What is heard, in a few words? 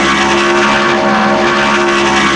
EXT med, Vehicle; NASCAR Thunder 2004